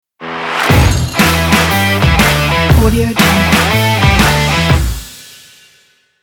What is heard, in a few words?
powerful indie rock logo